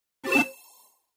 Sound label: Score points in game, امتیاز گرفتن در بازی